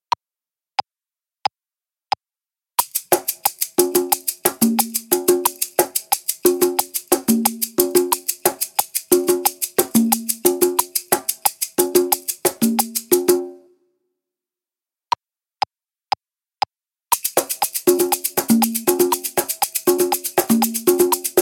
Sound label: Shaker congas